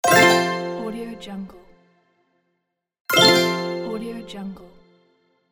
farm game button

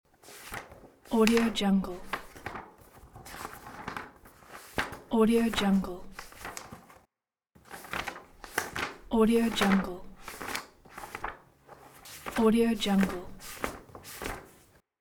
page turn

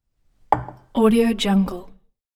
full glass on wood table; chicasound

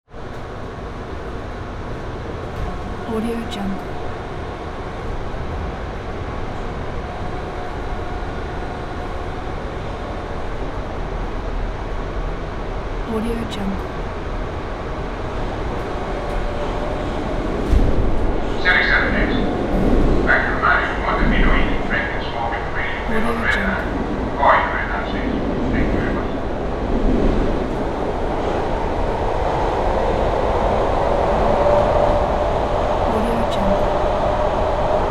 metro redline

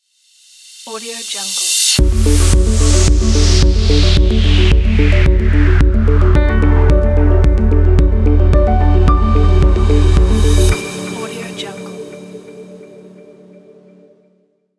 ambient corporate logo

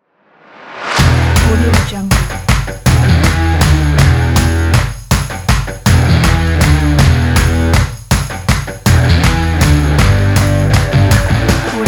indie rock kit; musicaura

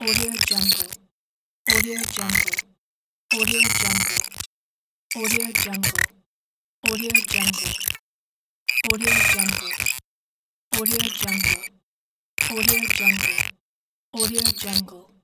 glitching

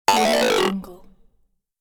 cartoon run it

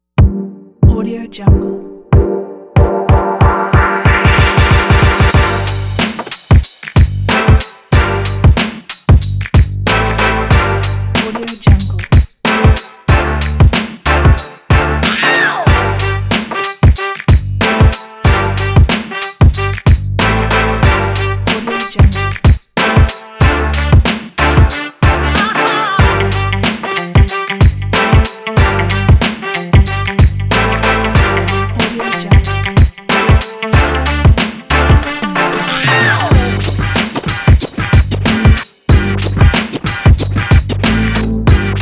positive hip hop beat

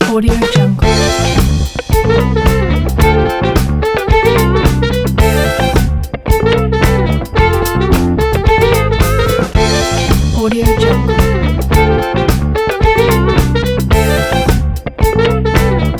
fashion funk; swanmusicstudio

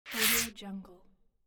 rip paper

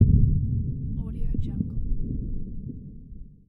underwater torpedo impact

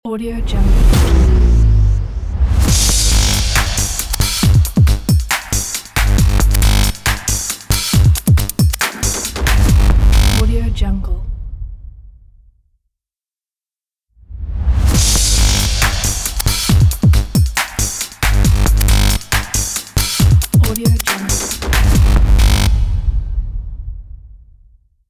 electro step trap logo

